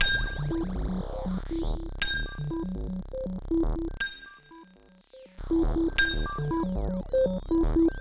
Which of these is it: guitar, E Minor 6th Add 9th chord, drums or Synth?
Synth